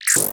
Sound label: Glitch Dirt; soundbits